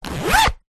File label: plastic document wallet zipper; office